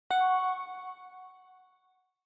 OS Sound Notification

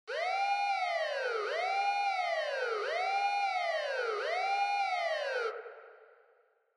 Police Car Siren Fake Reverb Fast

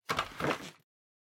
Vacuum Cleaner Body Pick Up

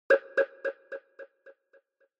Onoma Sigh Low Slow

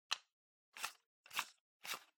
Pendant Light Bulb Insert

